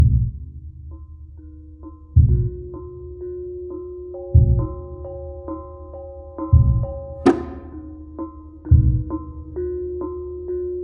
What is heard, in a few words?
Jungle Level; sim theme park theme park world 1999 pc gamerip